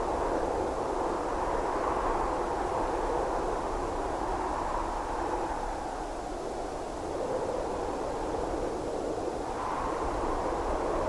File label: Sky Ambience Quad; Journey